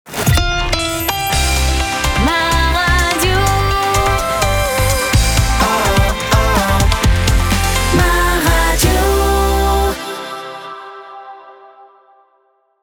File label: jingle; MA RADIO AC VOL.2